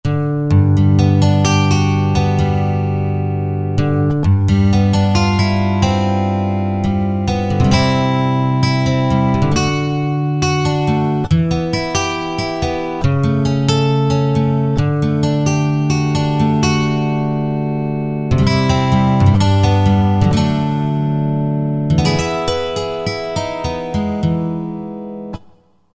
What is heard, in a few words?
Clang Guitar, Roland JD-990; Factory Patches